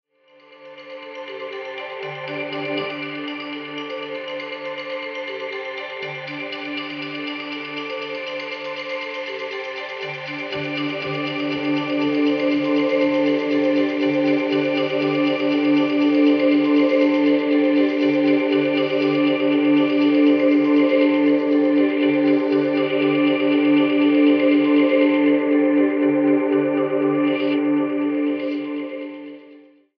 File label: Orch Noise